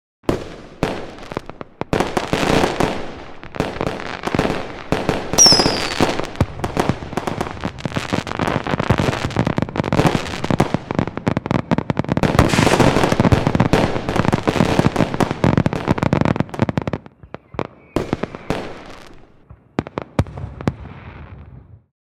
Fireworks display various fireworks and rockets grand finale